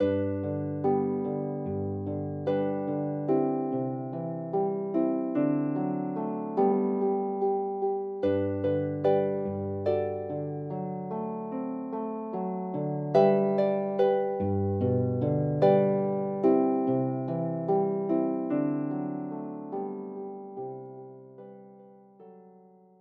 Harp; Famous Music for the Harp Vol 6

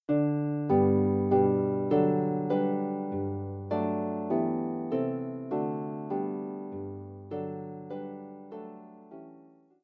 Harp

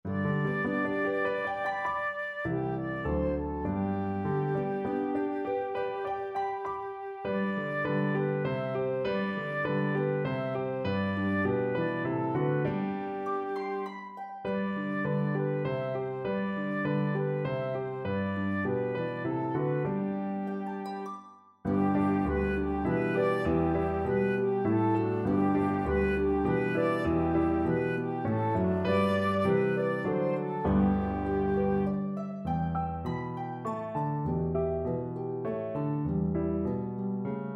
Trio Flute; Come Shepherds Rise

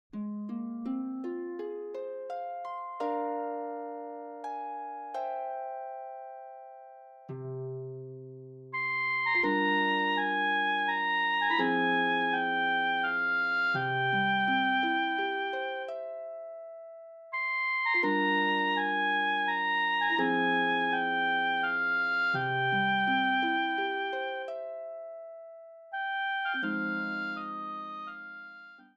Oboe and Harp; Two Miniatures for Oboe and Harp; movement 1

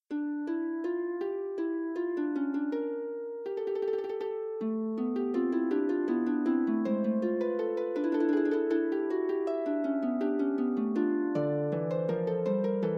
Fugue, Harp

